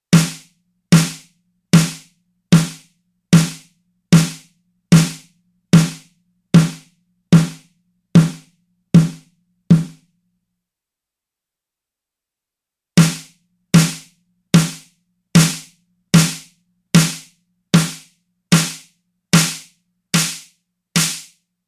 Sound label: Parameter ToneColor